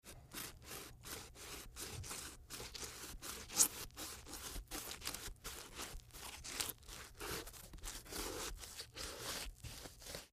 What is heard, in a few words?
MedicalScientific; Soundfx III